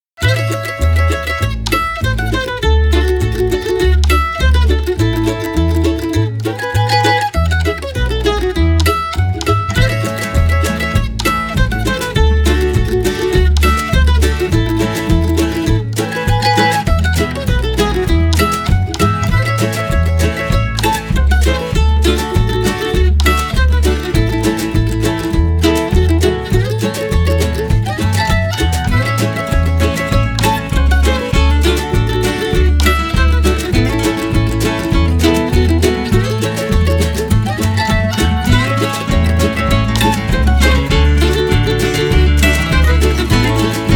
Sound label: background music, Wild West showdown; SaloonaArcade